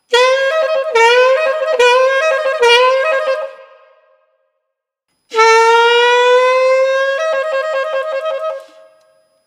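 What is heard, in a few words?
tremolo legato, Saxophon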